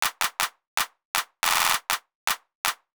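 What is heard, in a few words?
clap intro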